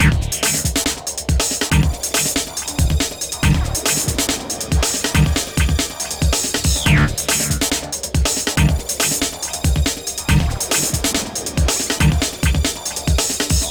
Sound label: Med Groove 2 Hi; Unreal II The Awakening; Acheron